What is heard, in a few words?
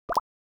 notification